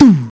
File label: acoustic tom drum one shot c sharp key